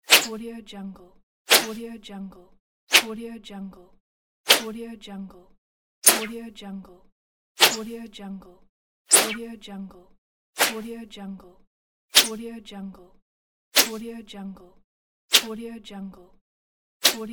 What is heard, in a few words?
arrow fly by swish